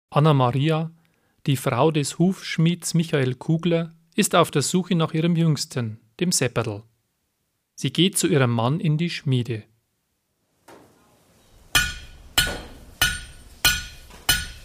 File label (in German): singspiel